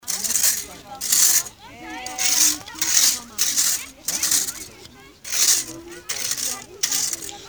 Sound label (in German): geraeusch rechen Sprachmemo